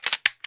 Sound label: PIST OUT; outlaws